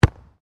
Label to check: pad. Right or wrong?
Wrong